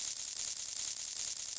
count Up Big Win; dead or alive 2

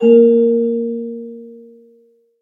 melody Bb3; fairymirror

